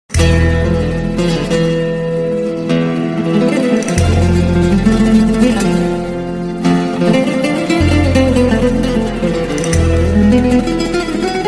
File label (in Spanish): nuevo tono de musica clasica ringtone